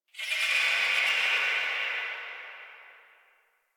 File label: Ext Bat Chatter; Ambience; Misc Outdoor; Warframe; Infested Eris